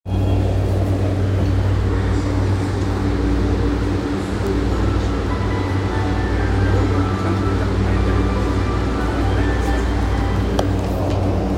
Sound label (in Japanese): 半蔵門線神保町西, train sounds